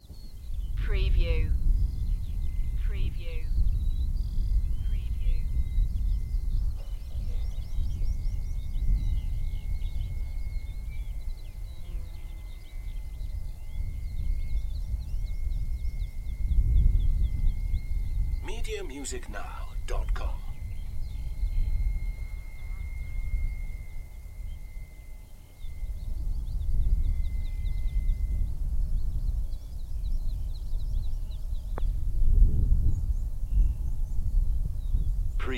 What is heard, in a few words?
Windy with noisy wind turbines and nature sounds; beanstalk audio